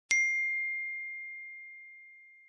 iphone mobile sound

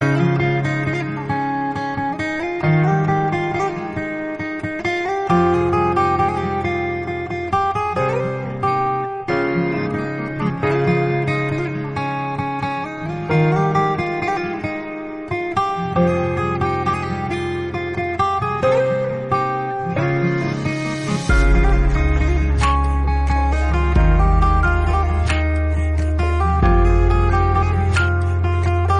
guitar instrumental

